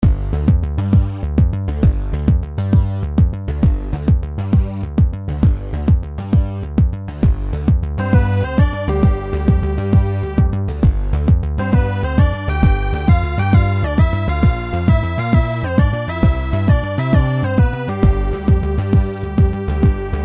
music in game

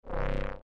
door throb

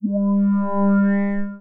start; ubertube; driving

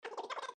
robo

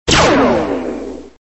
laser zap

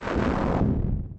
Explosions

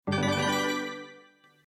fruitcake sfx collect

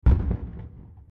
nuke

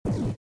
ion pulse

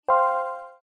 bottle Bomb

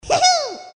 heeheeee

